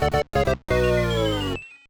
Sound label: Battle Lose; Mario Kart Super Circuit